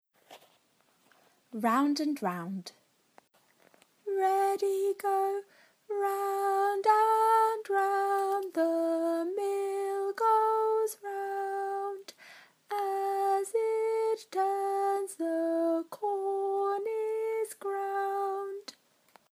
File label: round and round song; eyfs and key stage 1